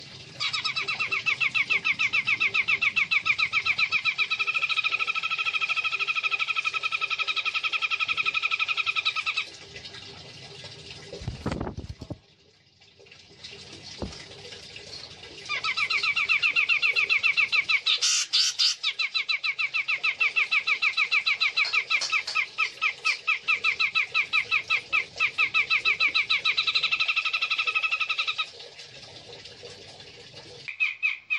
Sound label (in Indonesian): suara cucak jenggot sumatra